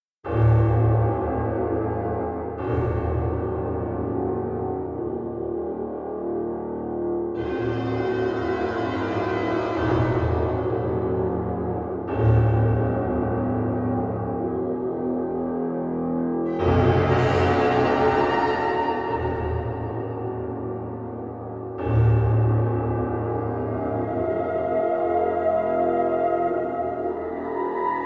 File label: Suspense Short Score Part